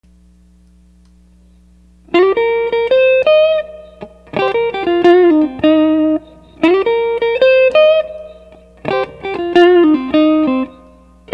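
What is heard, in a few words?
fender front, Electric Guitars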